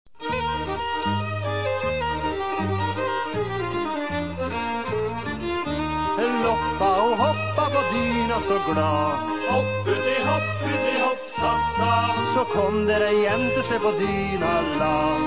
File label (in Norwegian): Loppa ho hoppa, folkemusikk